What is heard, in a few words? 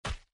hit enemy